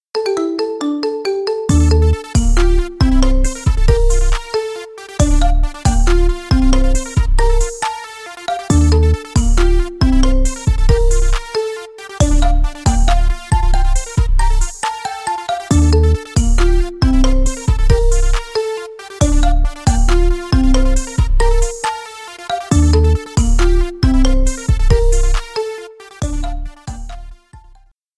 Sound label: marimba remix; iphone